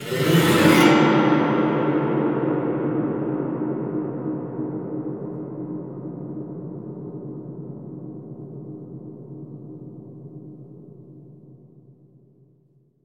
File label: screw zipper; Cinematic Metal Impacts